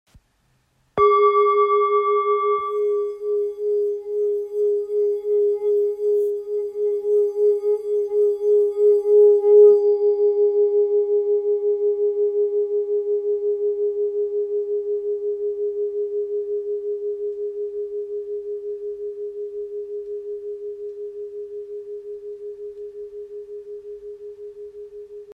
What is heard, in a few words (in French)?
Bol ancien sol diese